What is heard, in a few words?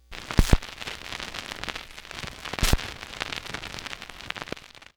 Record Start, Vinyl Record; Record Noises